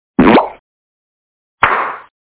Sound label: SUCKER; Spanish Net